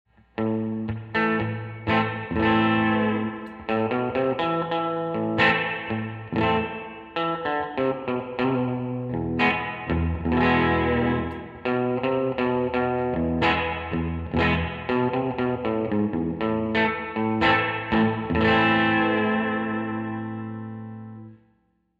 reverb into overdrive

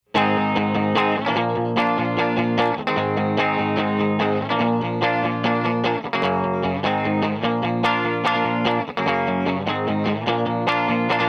strat

